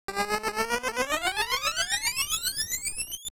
lightning charge, 8-bit; 8-bit Platformer SFX

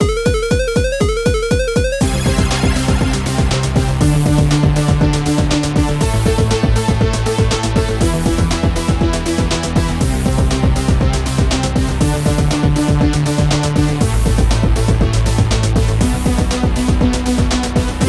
Chiptune; Survive

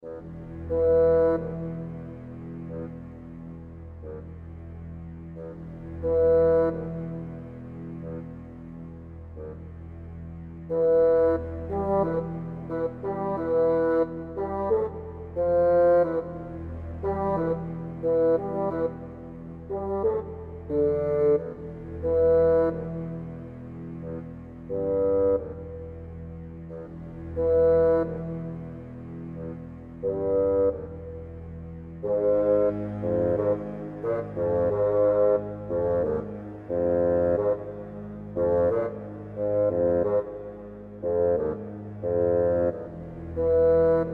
the grumpy goblin

